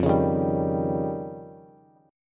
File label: F 7th Suspended 2nd Sharp 5th, Bass, Bass Standard; F7sus2#5 strummed